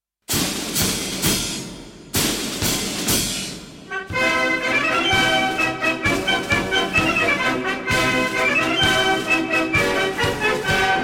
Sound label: Chorus, band; Jubilee